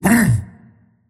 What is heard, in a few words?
pain; tiny